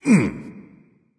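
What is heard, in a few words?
nonverbal grunt lift; smite; voicepack; ullr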